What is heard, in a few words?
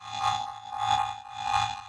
Powerup Idle; Rampage